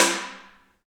Kenyan Drum, Africa hand drum; Roland LCDP14 Africa VOL-2

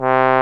BRS TRB, Solo T.Bone; Roland L-CD702

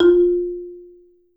SOFT F3, Marimba; Sampleheads; Dave Samuels Marimba & Vibes

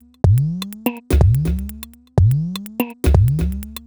Top 124bpm, House Drumloops; Zero-G House Fabrik Drumloops

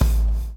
BLOW; Spectrasonics - Backbeat

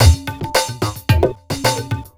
Indio gruv (in E); Sonic Foundry (Sony Creative Software); Ugly Remnants VOL-2

